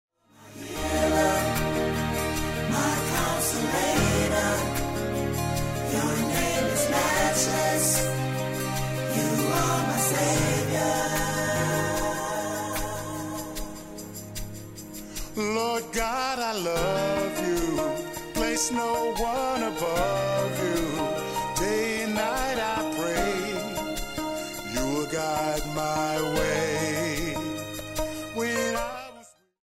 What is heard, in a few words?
vocal, Gospel Quartet